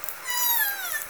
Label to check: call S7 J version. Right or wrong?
Right